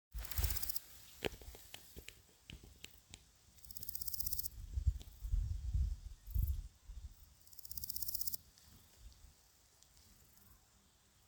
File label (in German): Große Goldschrecke